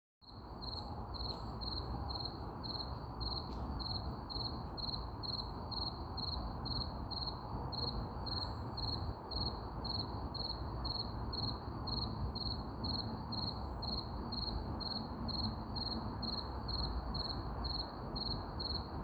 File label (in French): Cri cri